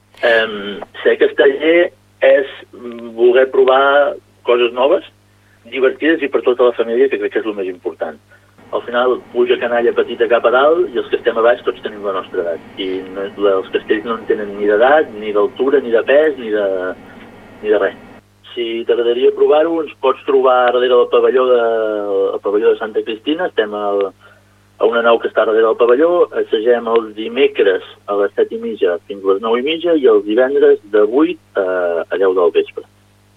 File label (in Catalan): castells